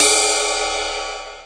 RIDE, Big and Heavy Real Drum Kit; REAL LIVE KITS

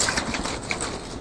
water splursh; Weird and Interesting Hits; single hits

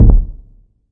classic hip hop kick; Classic Hip Hop Mega Kit

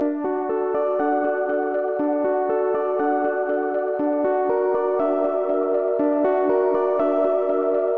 wistful bells quiet; STRINGS ORCHESTRAL CLASSICAL LOOPS

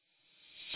Processed Ethn Perc, ETHNIC and WORLD PERCUSSION; Doru Malaia Percussion

